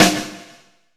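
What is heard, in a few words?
SNARE, drum machine; Drum Mashines; EdgeSounds; JD DRUMS KIT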